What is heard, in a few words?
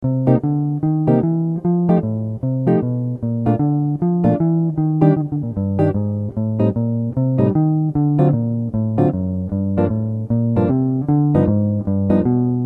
chord